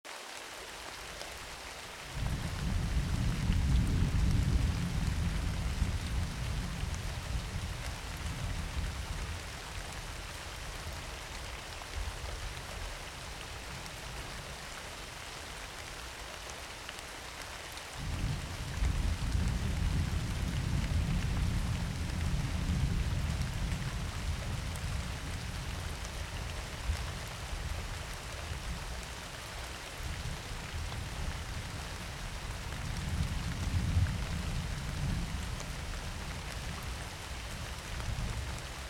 thunderstorm

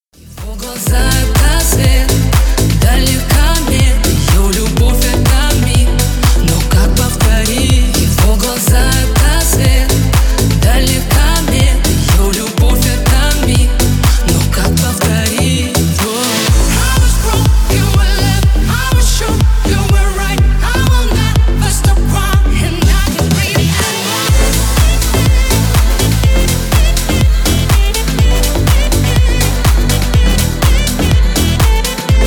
sax remix; russian

